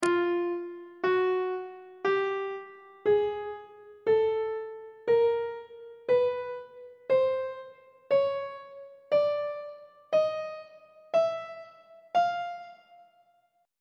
e sharp chromatic scale 1 octave of notes on treble clef